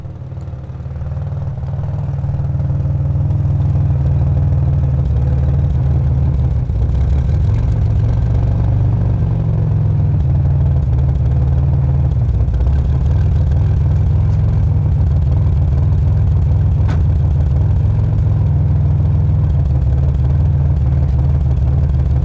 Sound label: Auto Performance Car Rumbly Engine Idle Close Bassy